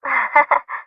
giggle; chihiro; alter ego

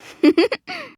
giggle; aoi

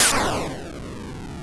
speed off; sonic

